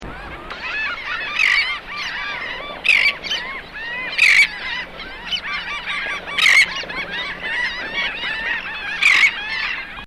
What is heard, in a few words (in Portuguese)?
Trinta reis real